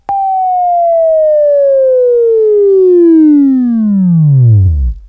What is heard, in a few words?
WAV Right, Sweeping